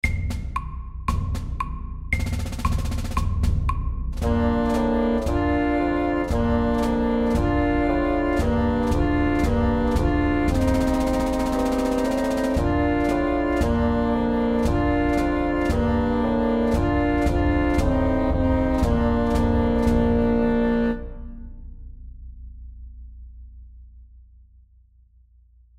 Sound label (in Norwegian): Skolemarsj FAST 115